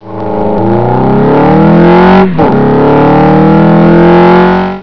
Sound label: car sound